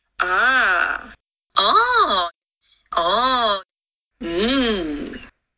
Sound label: aaah oooh eeeh; SummerSchool2021